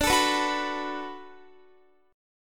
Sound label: D 6th D down Guitar, chord; D6 strummed; tuning 4E,4A,5D,5G,5C,6F